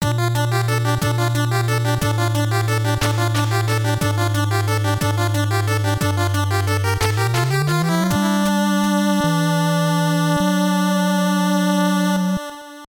Game Over, Continue; conquest of the crystal palace nes

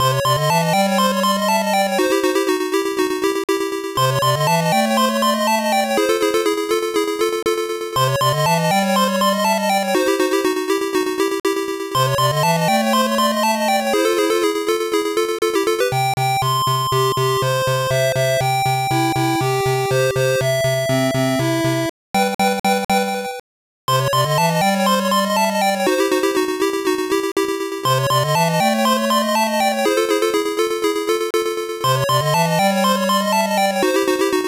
Minigame BGM; kikansha thomas ni notte pico gamerip 1995

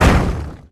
boulder impact stone

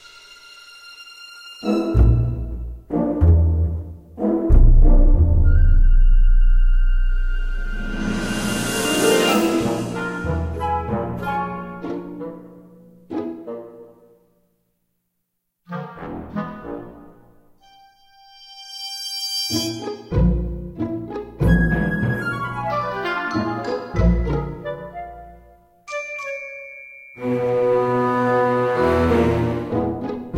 Quirky; Back to the Future: The Game; Ep. 1 It's About Time

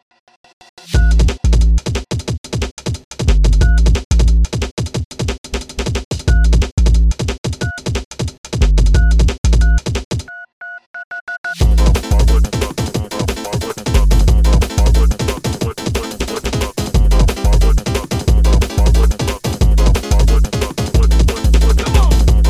Instrumental; Spindash

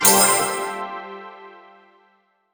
area completed; Dash Quest; android

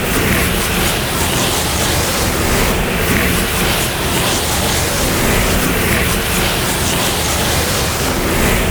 Traffic Flyby; Nicktoons Nitro; arcade

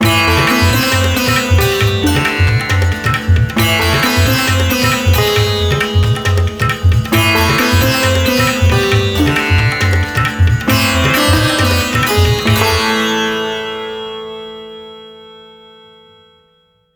Match Over; Great Tiger

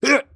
worm attack

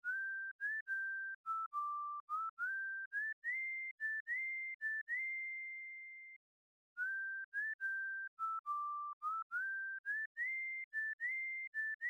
Whistle; Rookies; MS-DOS; PC-98

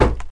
Log collision

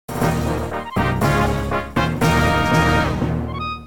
Victory!; Mazes of Fate; GBA gamerip 2006

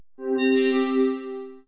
Heal

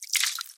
Blood Splut; Friday the 13th: Killer Puzzle

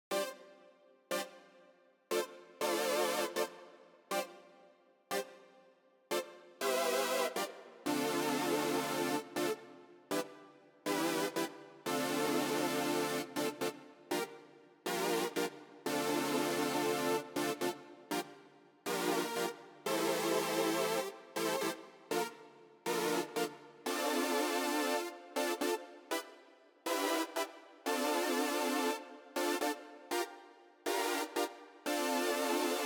Breakout Mellow Mix Stem Layer; Breakout Recharged

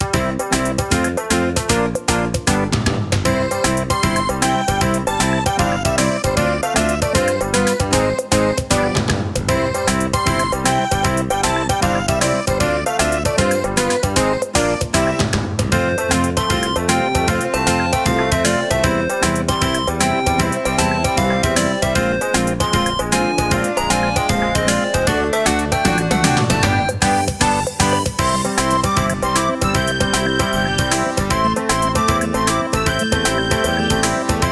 RAIN; Kirby's Return to Dreamland; Wii

